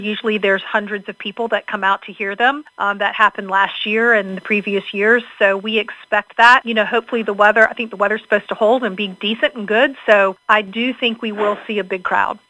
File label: Crowd; WCBC Radio; friday after five series